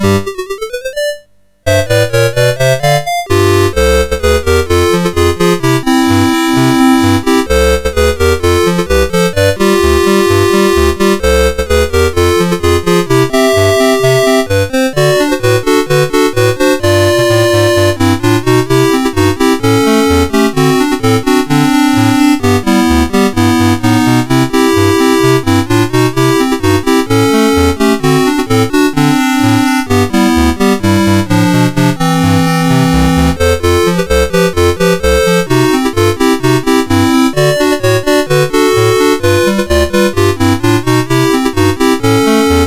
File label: Polka, MOS6581R4; Farrell